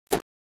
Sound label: Weapon Throw